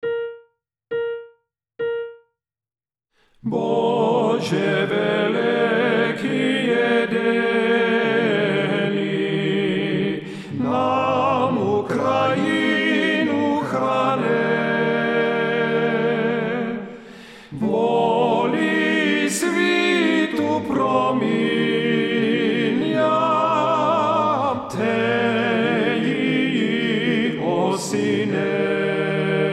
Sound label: Prayer for Ukraine TTB Tenor 1